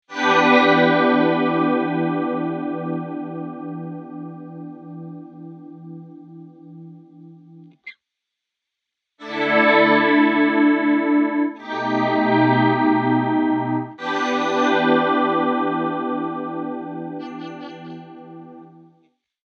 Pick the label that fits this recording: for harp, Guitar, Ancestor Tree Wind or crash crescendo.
Guitar